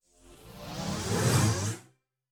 TRAP TRANSITIONZ

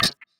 perc

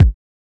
Kicks

